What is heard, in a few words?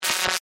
Glitch Digital; Sound Design; BlastWave